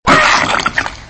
knife death

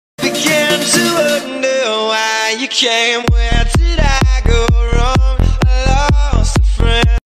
twitch subscriber sound

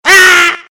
goblin death

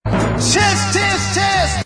chest

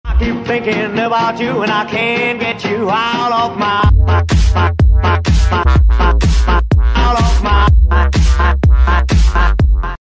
donation song music

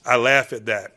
laugh

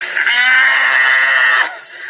groan; ebenezer t squint

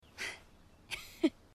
smile noise

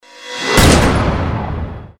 map loading

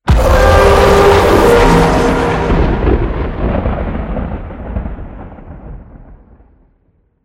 midboss low health; deadlock

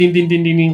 ding ding ding ding ding

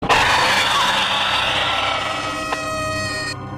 jumpscare; fnam 4 paint

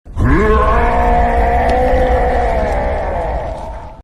roar scream; colossal titan

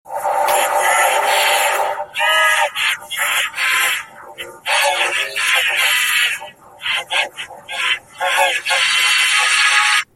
fbi screen scary version; wonder showzen

